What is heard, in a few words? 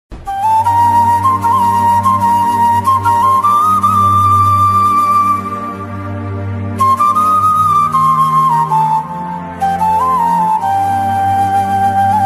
flute instrumental ringtone